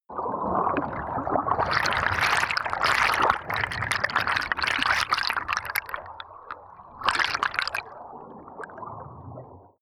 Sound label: canal